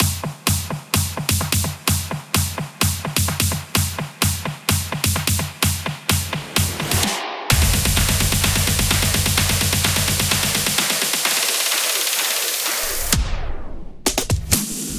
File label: 128bpm long fill